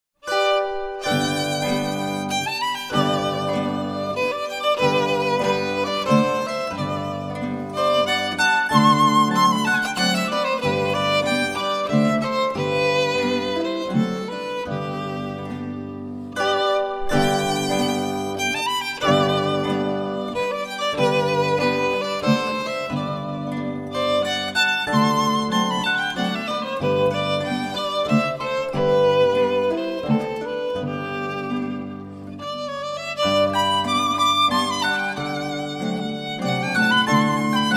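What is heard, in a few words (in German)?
schönes Menuett, zither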